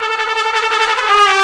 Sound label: trumpet danger; music; Left 4 Dead 2; Source Engine; zombat